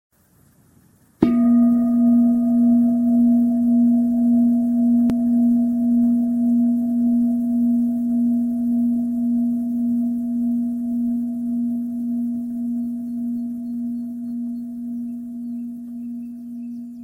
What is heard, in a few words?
washing up bird song; Практика мытья посуды. Пение птиц. 14 мин.